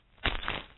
knife slash; weapons

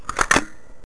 xm deploy; weapons; xm1014

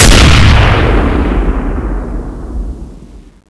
awp

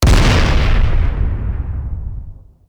explosion big, heavy bomb; explosions

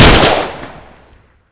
famas; weapons

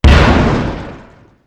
small explosion, light bomb; explosions

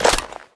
aug clip out; weapons; 2aug

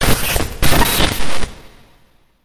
emp, explosions

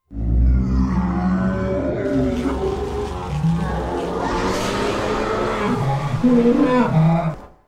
ply infection final; tvirus infection

